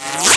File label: whizz